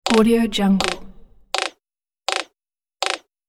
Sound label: roadrunner calls; sound ideas